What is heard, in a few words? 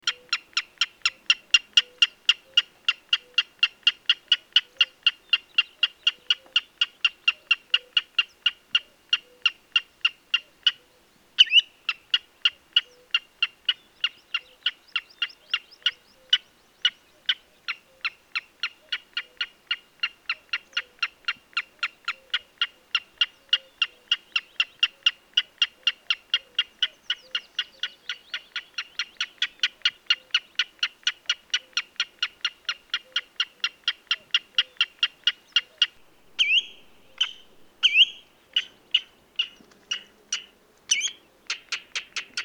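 goldfinch voice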